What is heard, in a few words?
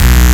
STACK03, Big Beat Samples; AKAI; STACKS KIT